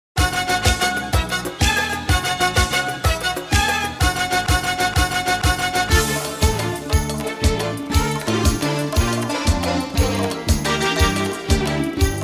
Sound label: Instrumental